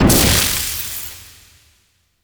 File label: Basketball Net Spawn; Warframe; Duviri